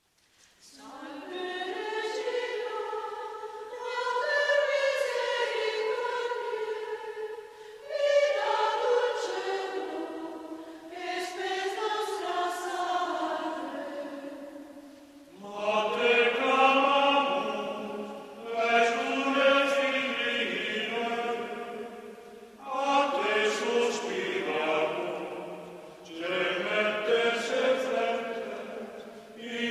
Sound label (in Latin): Salve Regina tonus simplex